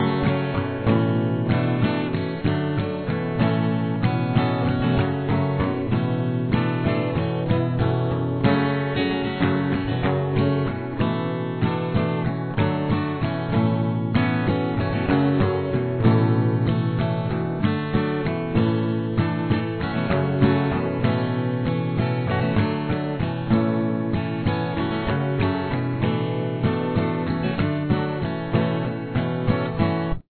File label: chorus, guitar